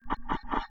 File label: Flapping Wings Of A Bird